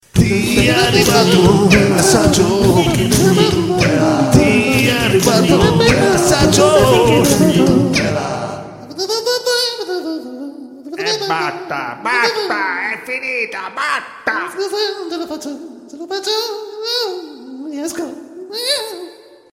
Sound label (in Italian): Coro assurdo